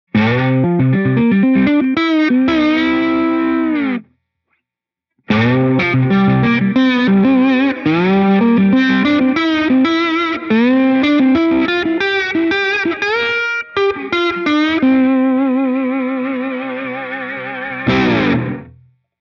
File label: Plate Classic Slide